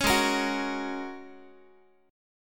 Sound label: G sharp 9th Suspended 4th, Guitar; strummed; chord; 16,16,13,16,x,x